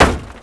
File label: hit, surface; WhiteOut